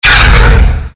shadow hit

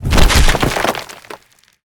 Spider Trap Activated; Lurker; Evolve; Gorgon

